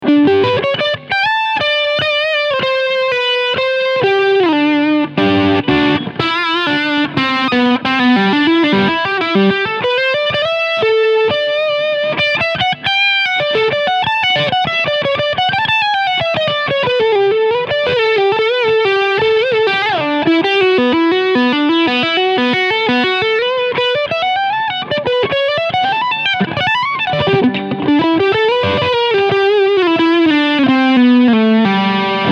PRS SE Silver Sky Overdrive Steg PU

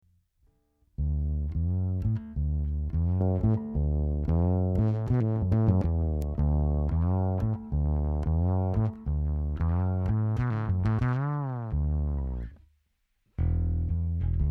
Bass Oberes Band Boost Und Cut

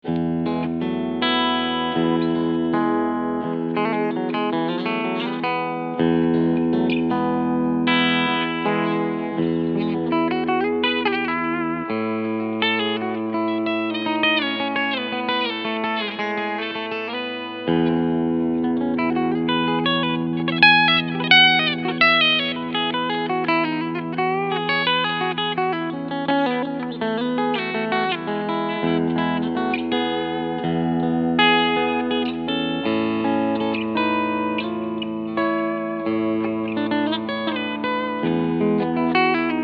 Harley Benton TE 20MN E Gitarre Cleansound Steg Pickup

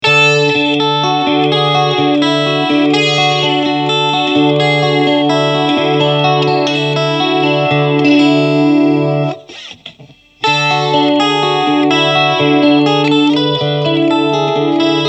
Clean mit Chorus

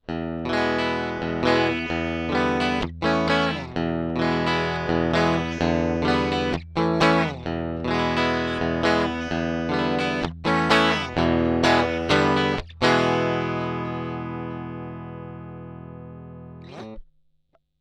E-Gitarre Preamp; Echo Fix EF-X3

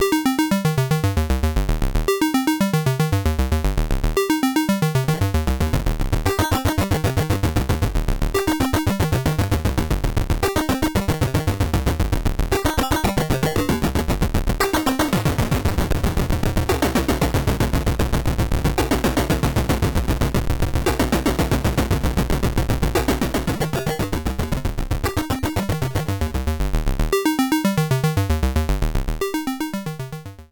Atminitron Arpeggio mit Distortion

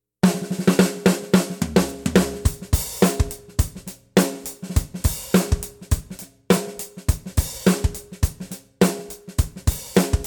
Protean mittel mit Ring

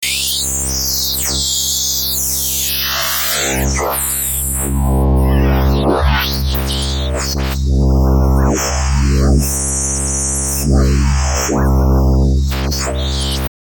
Harmor Wasserlilien

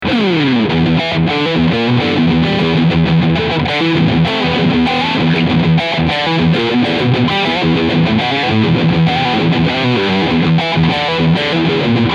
Jackson SL2A MAH Pro Soloist UWH Overdrive Riff Steg PU

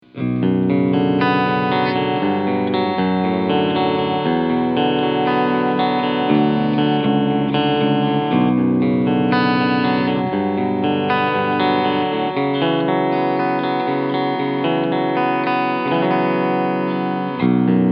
Clean SC Steg

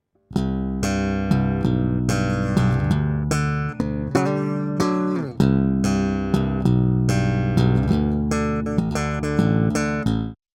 E Bass Clean; RND Portico

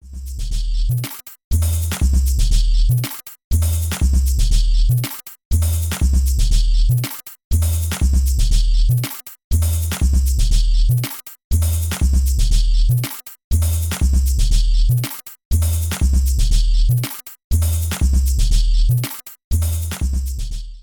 Slice normal Quant 16tel sync slice

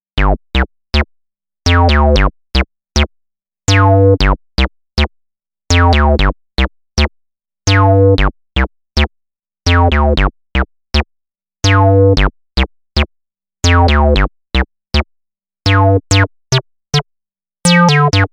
PulsBass mit Resonanz

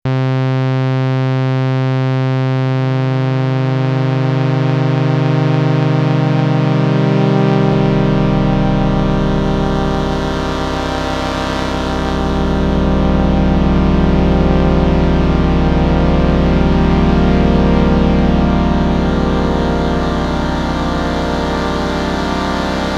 Wellenform Density im Chords Mode

